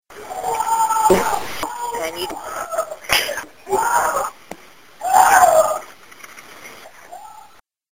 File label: Scream Combined